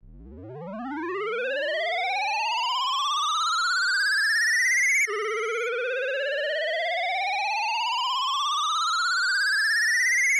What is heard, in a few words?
lfo synth